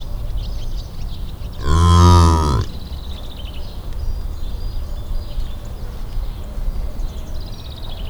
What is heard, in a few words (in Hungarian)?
egyet bog, kardszarvu antilop; debreceni zoo 2012; marantz puska